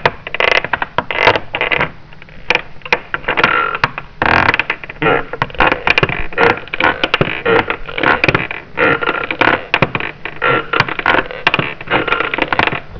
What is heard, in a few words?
CREAKY